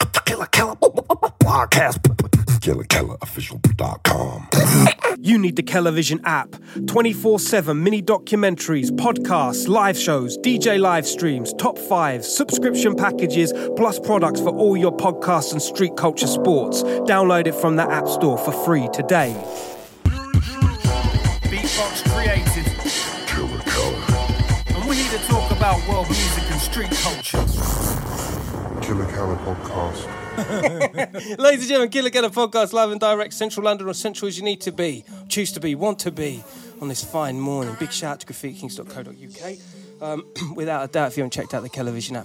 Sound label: breakbeat hip hop